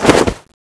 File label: dash; skibidi toilet glitch; Cameraman RANGED; snowman